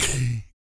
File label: BIG BREATH; ILIO; Vocal Planet VOL-2; Blues & Soul